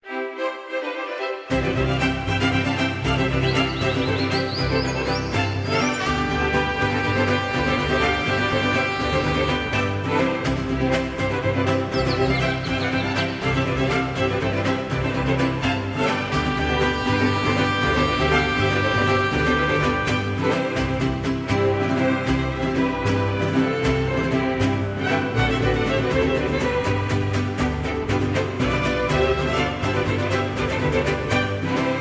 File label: Mariachi loop; City of Villains soundtrack